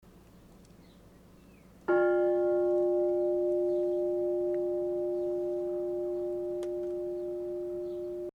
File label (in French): cloche; St Dalmas Valdeblore, cloche déposée